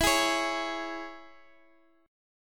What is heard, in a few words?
E 6th, down, Guitar, Open D